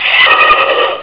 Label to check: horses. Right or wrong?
Right